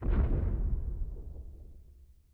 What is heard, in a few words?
giant Reel Slow